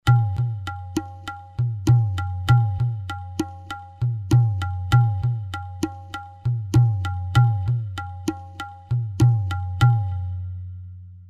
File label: kaherva, tala